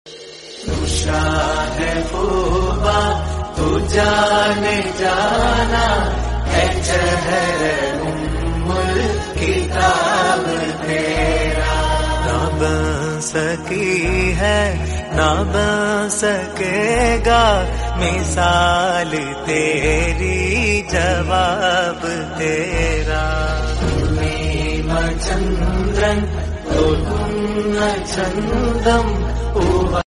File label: malayalam